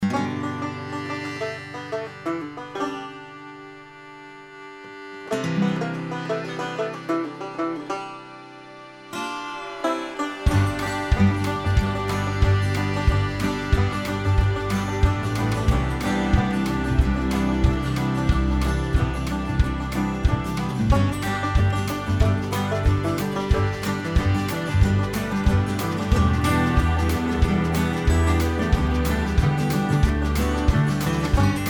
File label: Irish Mountain Banjo